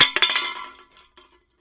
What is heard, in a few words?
Aluminum Can Roll On